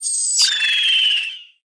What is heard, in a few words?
Vampire Bat Die